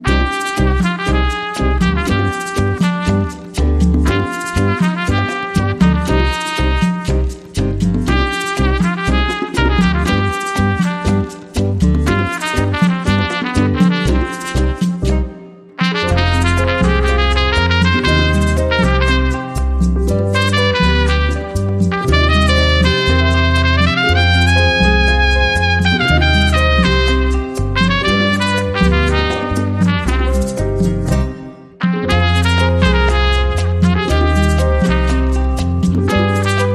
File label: Ballroom Tango